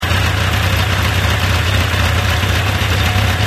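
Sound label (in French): ralenti orig, Yamaha XJR exhaust; akrapovic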